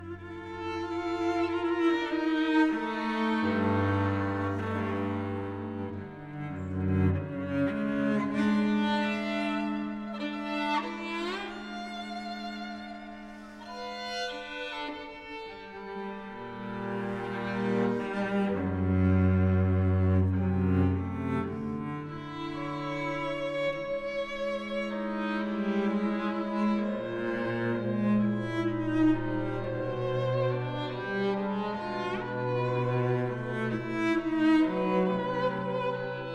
double concerto for violin violoncello and orchestra notturno